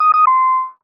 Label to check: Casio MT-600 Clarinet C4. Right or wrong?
Wrong